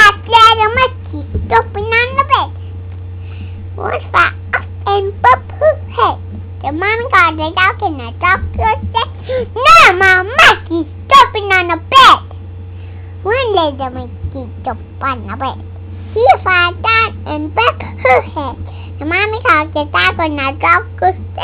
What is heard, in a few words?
five little monkeys